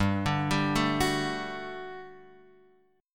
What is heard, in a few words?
G Major 7th Suspended 2nd G; GM7sus2 Chord {3 5 5 2 X 2} arpeggiated